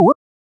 SFX Jump Job; life is a game android

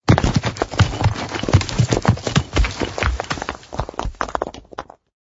rockslide; Monster Arena

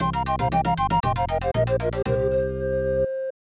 Fail; Ozumou Tamashii; SNES

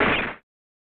Gunshot; Super Play Action Football; SNES

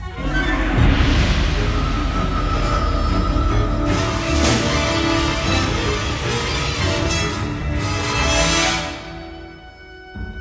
music, dungeon attack; Lego Batman 3 Beyond Gotham

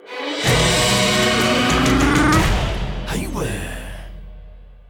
Goal Fanfare; crash bandicoot series sound box; Tiki Turbo

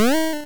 Lovely Kiss; Pokemon; Gen 1; attack moves; RBY

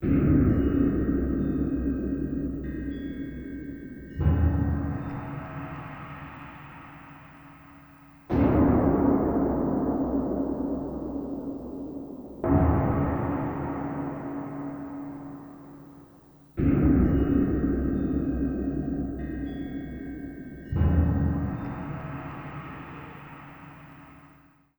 Scary Short; Hitman Blood Money

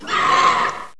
spawn spitter

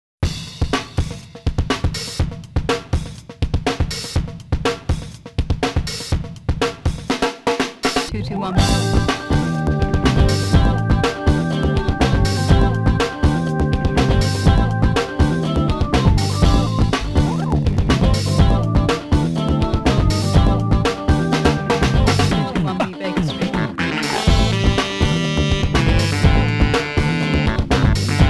Saxophone Storm; King of Fighters Maximum Impact 2

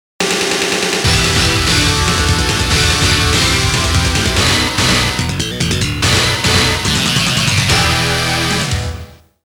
GAME OVER; Data East Retro Game Music Collection; DEATH SCYTHE

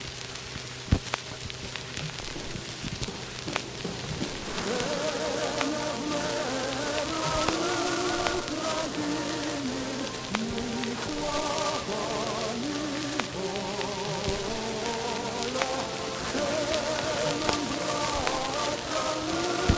Ukr Hymn

